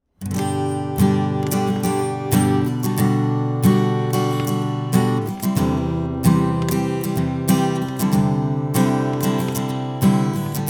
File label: Acoustic GTR Untreated